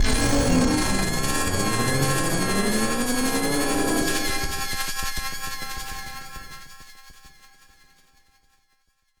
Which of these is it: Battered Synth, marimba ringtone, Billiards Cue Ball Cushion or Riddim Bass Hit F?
Battered Synth